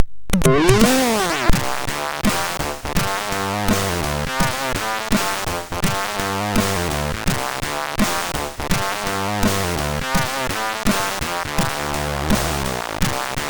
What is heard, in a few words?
Jingles, sid MOS6581R2; Deek